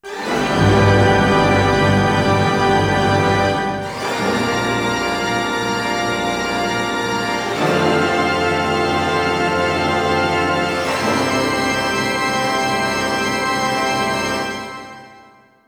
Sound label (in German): Orchester Auftakt Jingle F